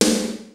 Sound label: PHAT TRAX KIT, DRUMS; Kid Nepro; Elite